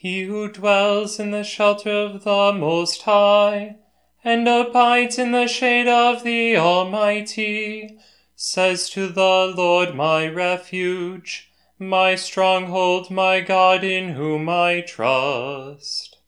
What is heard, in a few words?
psalm stanza psalm tone variation